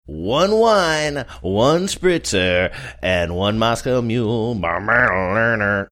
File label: singing hook; one wine one spritzer one moscow mule